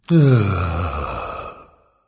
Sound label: content, voice; smite; voicepack; ymir